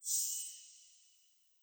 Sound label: empty portrait shimmer